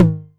Tom Hi, Novation Drumstation 909

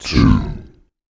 VOICE FX, Dance Vocals

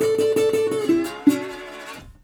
120, Blues Guitar; ILIO - Fretworks

